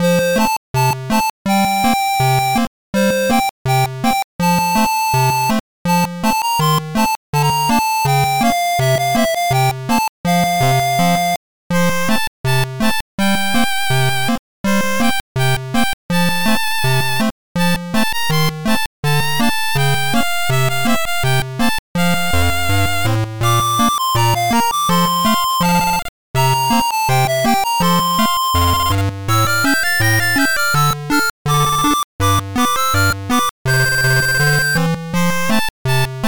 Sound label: 8bit